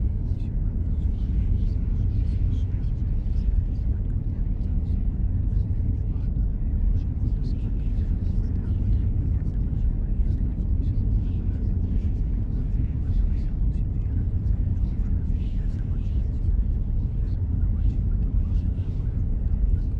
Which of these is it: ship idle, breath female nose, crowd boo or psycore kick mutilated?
ship idle